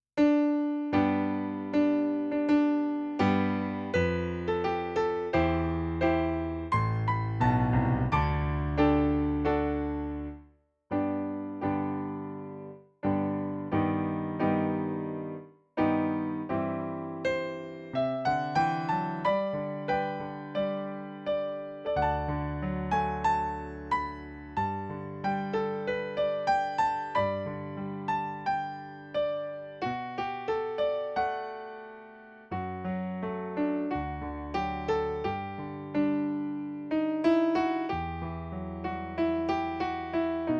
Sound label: Piano